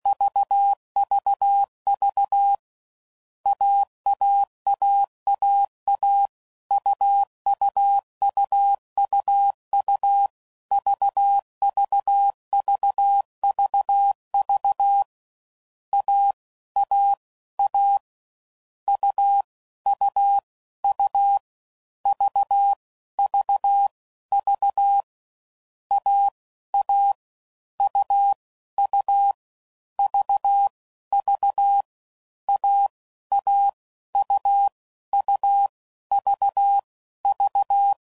AUV AUV, CW Code Groups 5WPM; Morse Code; Difficult Combos

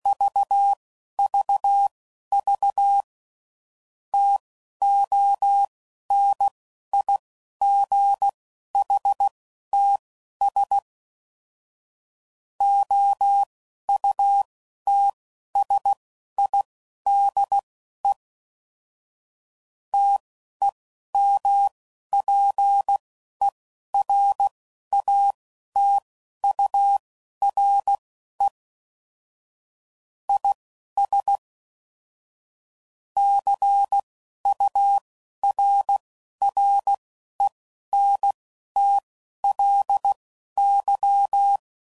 CW Code Groups 10 WPM, Weather; Morse Code

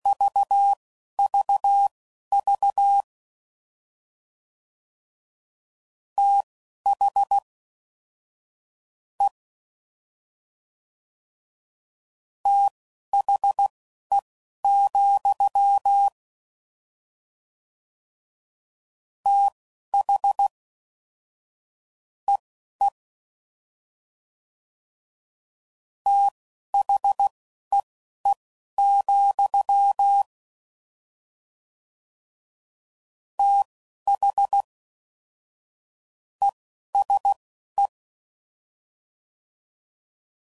Fast TH Prefixes, CW Code Groups 10WPM; Morse Code; FLs & THs

